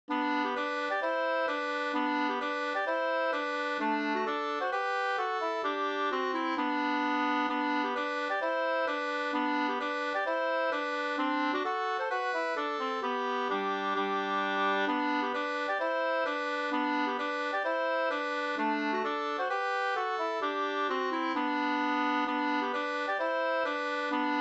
schottis; Stockholmsschottis 2st V1

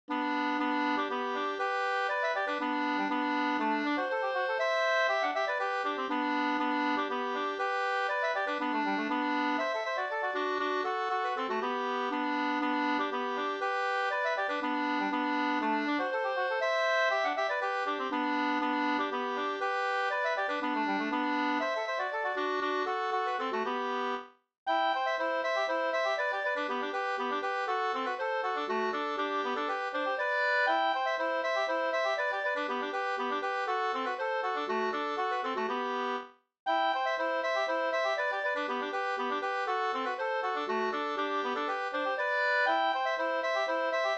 polska stampolska

